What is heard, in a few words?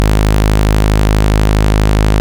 Saw C1, Synths; Multisamples; Saw SH; Ableton Live 12 Lite; Core Library